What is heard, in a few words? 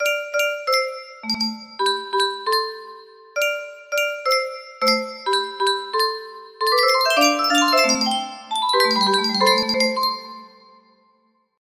music box